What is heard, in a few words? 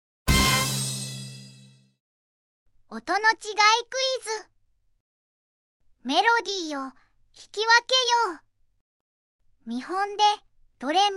quiz doremi